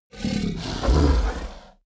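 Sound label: fishman snort breath, creatures; kreaturen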